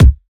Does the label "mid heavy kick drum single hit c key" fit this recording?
Yes